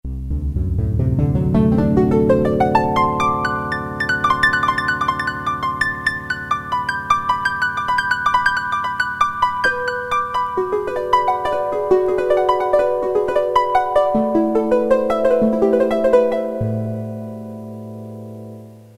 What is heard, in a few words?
Harp, Roland MT-32